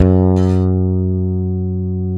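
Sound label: Sadowsky 5 bass, F#2; Sampleheads; Will Lee Bass Library; SADWSKY5 SUS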